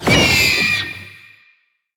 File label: heroes broden terror shriek cast